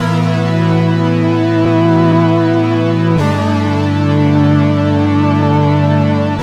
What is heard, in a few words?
Big Analog, Synth Sequences; Keys; Drum & Bass